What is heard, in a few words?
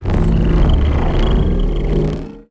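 reload element; cstrike; valorant; araxys operator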